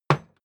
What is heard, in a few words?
hammer wood hard